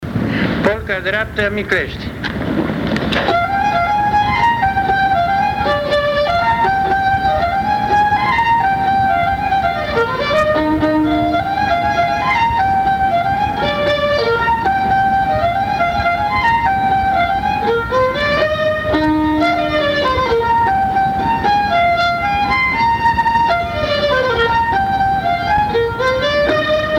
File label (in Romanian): Polca dreapta, joc si cantec popular vasluian; Centrul Judetean pentru Conservarea si Promovarea Culturii Traditionale Vaslui